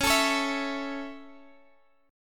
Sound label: C sharp Minor Sharp 5th C sharp, chord; strummed; C#m#5; x,x,11,14,14,12